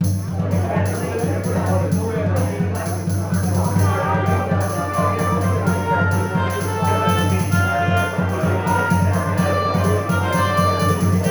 Acoustic

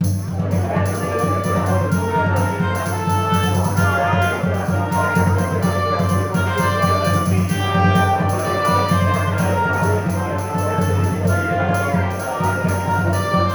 Acoustic

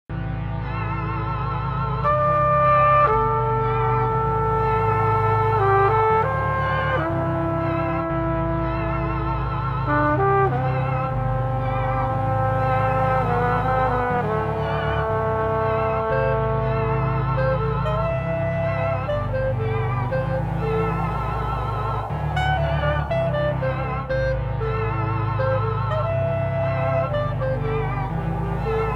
Arpeggio; Arpeggio Bust

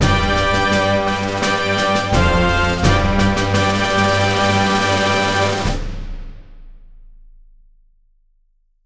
Fanfare

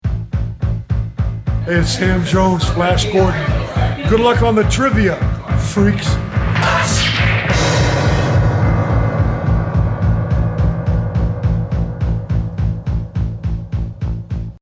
ringtone

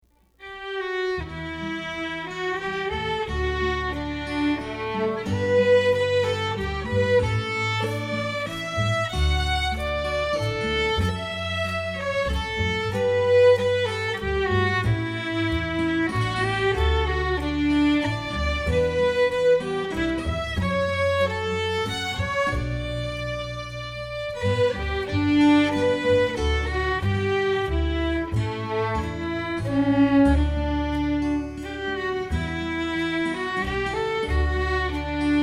waltz; Off to the Woods

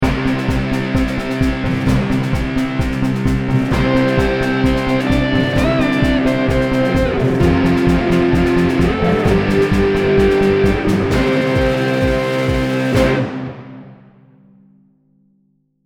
no synth or tpt

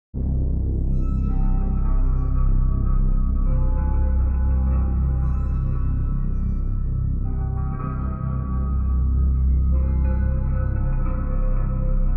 Piano; Beowulf

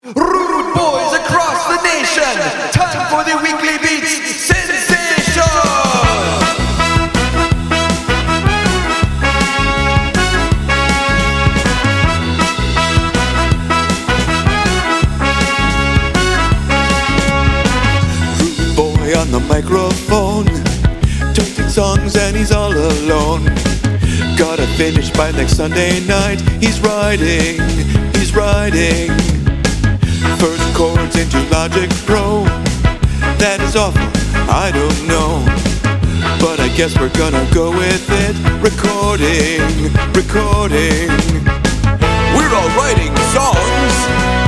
Ska; Weekly Beats

